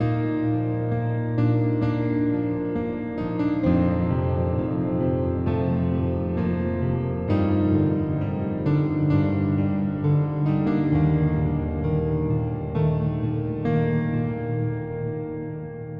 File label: Piano